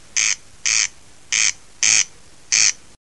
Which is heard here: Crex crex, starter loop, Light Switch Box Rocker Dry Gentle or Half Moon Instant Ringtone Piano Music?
Crex crex